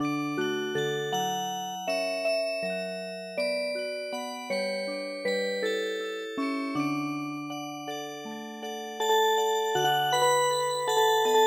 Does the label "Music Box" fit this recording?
Yes